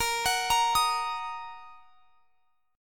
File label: A sharp Suspended 4th Sharp 5th; A#sus4#5 arpeggiated; x,x,20,23,23,23